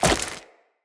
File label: Tenno Wall Jump Goo, footsteps, wall run; Characters